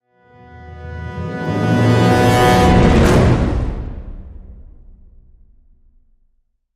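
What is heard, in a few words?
Hit Orchestral Brasshole B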